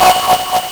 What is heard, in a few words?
LSDj, Nintendo Gameboy; 300 Drum Machines